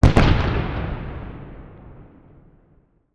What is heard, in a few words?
Explosion Distant Cannon Fire; Fire and Explosions